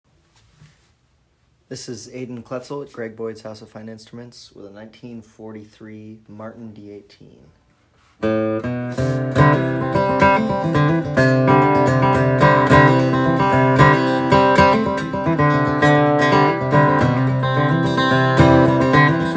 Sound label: Martin d18